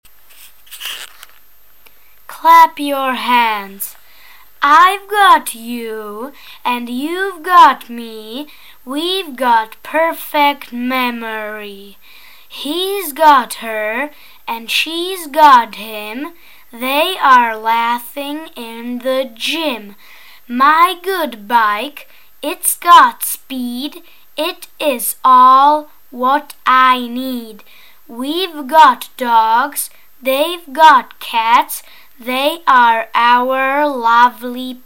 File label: clap your hands